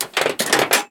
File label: Metal Sheet Move